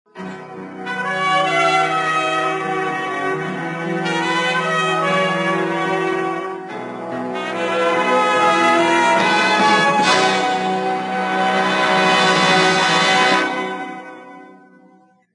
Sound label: mouse fanfare